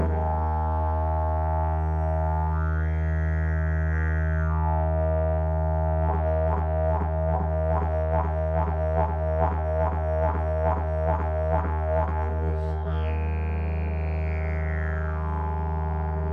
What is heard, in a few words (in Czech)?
javor, didgeridoo